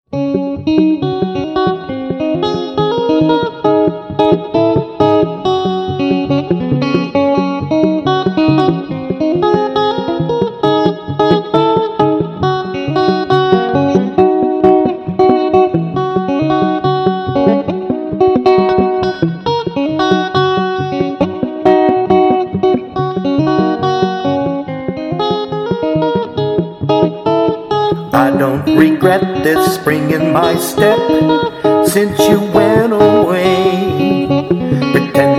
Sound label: E Major Rag in F Sharp